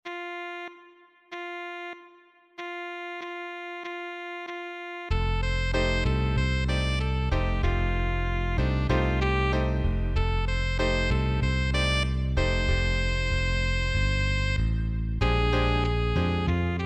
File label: play along drums